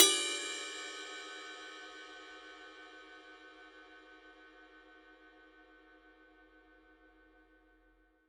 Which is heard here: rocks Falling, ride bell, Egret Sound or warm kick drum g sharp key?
ride bell